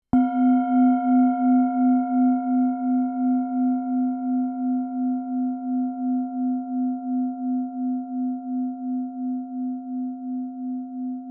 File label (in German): klangschale sonne